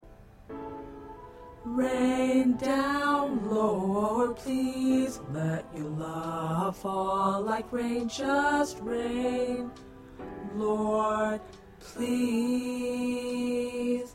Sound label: Tenor